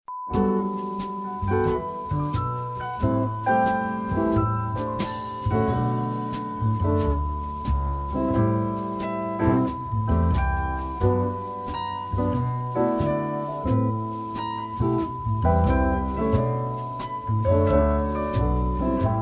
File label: cheerful time